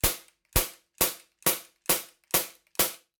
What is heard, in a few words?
C02 Shooter A Gun Shot Rapid Fire; Nova Sound